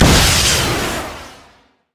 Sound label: rocket grab; LoL; blitzcrank skin08